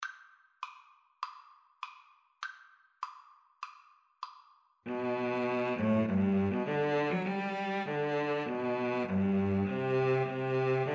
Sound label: Cello Duet